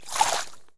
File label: splash, footsteps